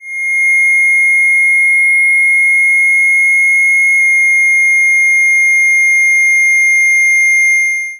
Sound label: clipped polyBLAMP